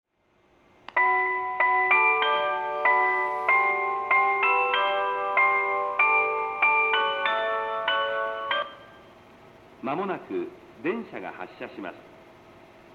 melody, station

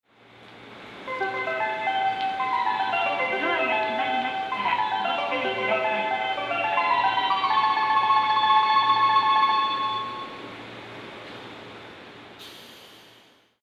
melody, station

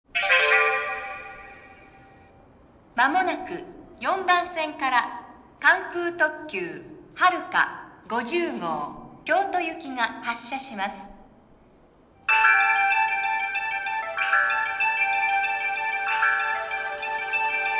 melody, station

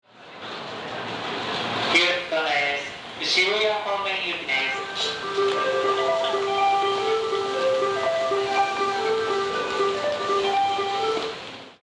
melody, station; 3番線（山手外）発車メロディ2; yurakucho; jreast yamanote

